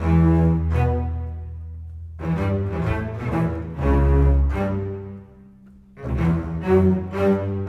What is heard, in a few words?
Bass Cello; Sonic Foundry (Sony Creative Software); Orchestral Series VOL-4; Rock and Pop; Rock-Pop 19